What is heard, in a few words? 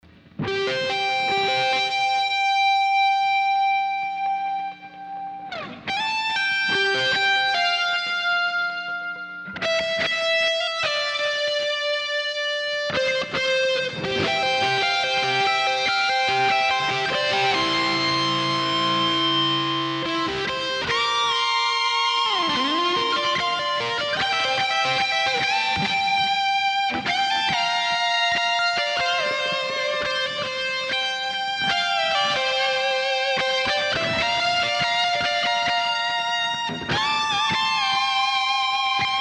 Guitar Solos